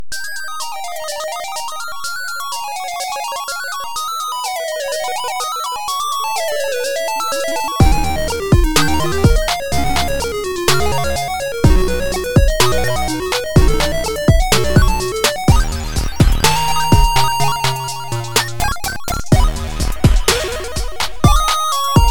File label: Bubble Squash PSG Digi Drums 125bpm